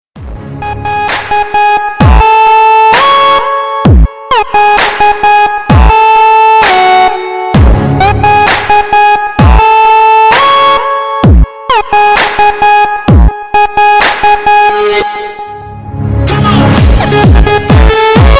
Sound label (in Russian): Громкая музыка на звонок - Loud Music